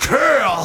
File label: voc hit; Sonic Foundry (Sony Creative Software); Ugly Remnants VOL-2; Beatstuff